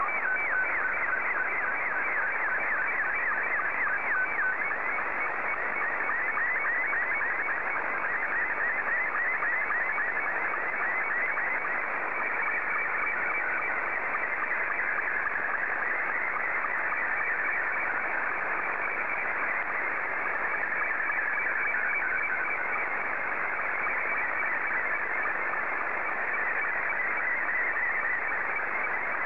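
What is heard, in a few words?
SSTV, Scottie 2; JA6GXP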